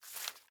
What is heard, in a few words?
ui pickup paper; Rust